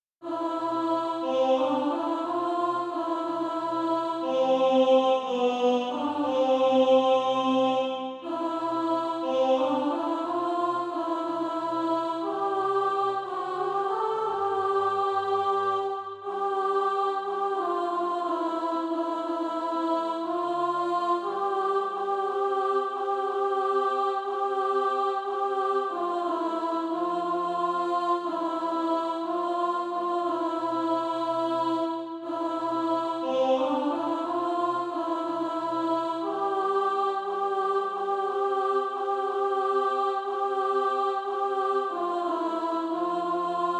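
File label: alto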